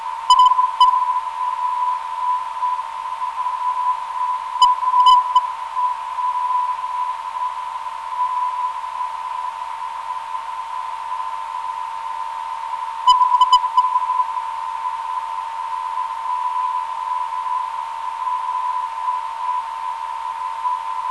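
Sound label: 491.94kHz, non-directional beacon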